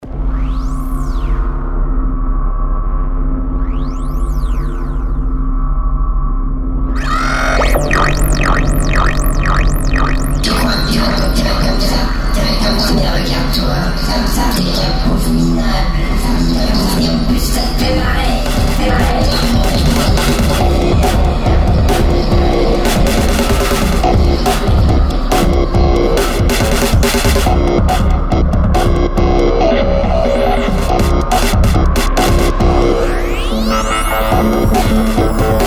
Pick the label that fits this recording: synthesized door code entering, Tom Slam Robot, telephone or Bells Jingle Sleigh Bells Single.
telephone